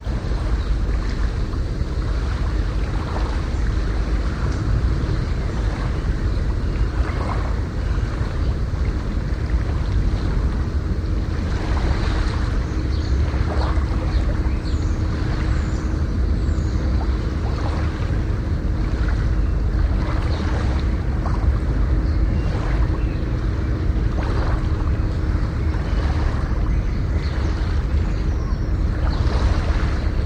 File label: Harbor Water Lap Bird